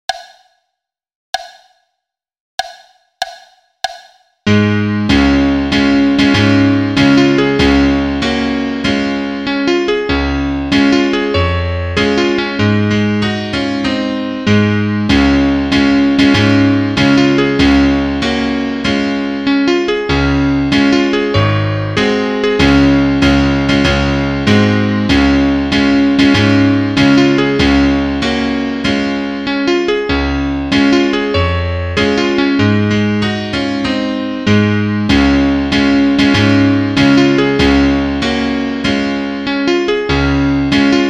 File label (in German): Klavier Solo, trompete; Marschpotpourri